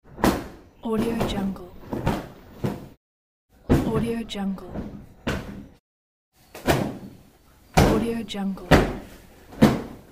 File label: bedroom cleaning sound effects